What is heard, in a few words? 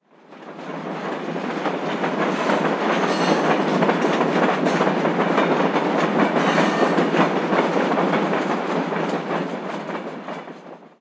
train pass; ambient; machines